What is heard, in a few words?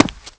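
run left heavy, FOOT; The Elder Scrolls III Morrowind GOTY; XBOX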